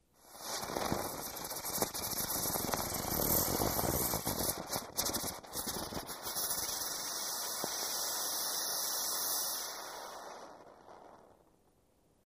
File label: Spinning Fireworks, spin